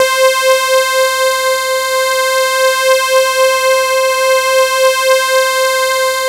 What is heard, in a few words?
OH BEE, KURZWEIL; BASIC-KW2000